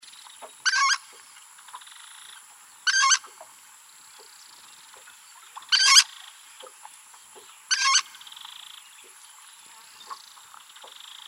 kamichi chavaria, chant

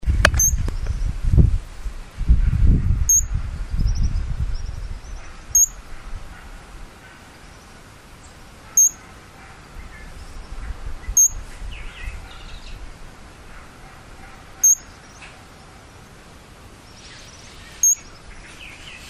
ariane de lesson, chant

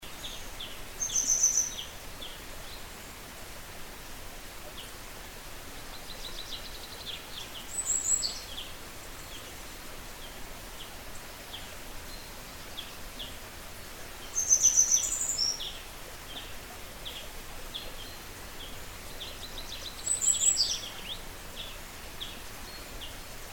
bergeronnette des ruisseaux, chant

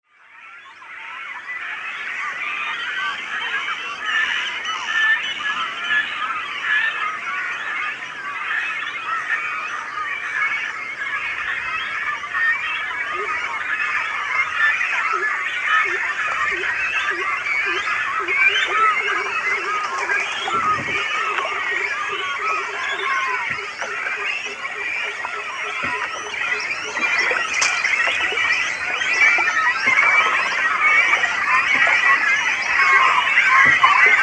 grand cormoran

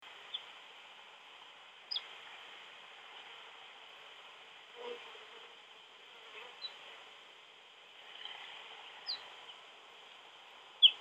bruant ortolan

